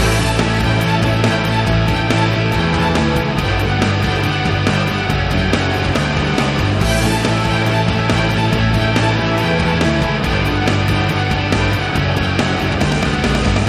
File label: Electric Rock Opus - Short track